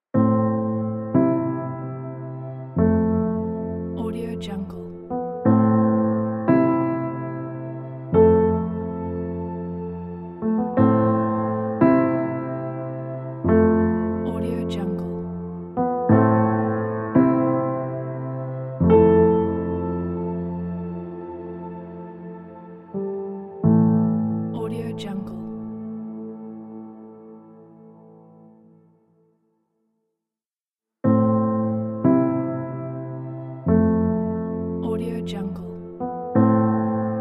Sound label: romantic piano logo